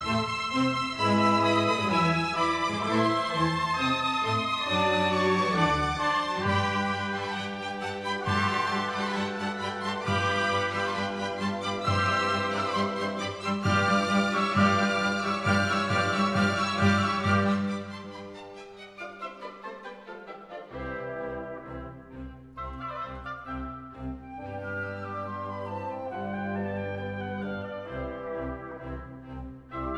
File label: Allero Maestoso, Piano conc; Mozart